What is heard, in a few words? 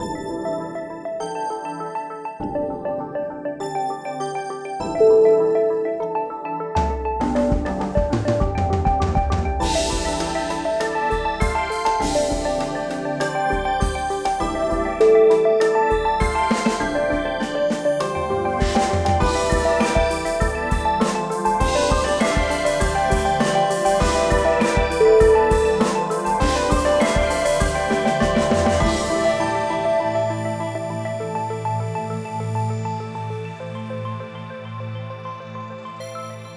tech logo